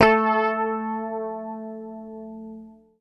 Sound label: PICK, Bass Library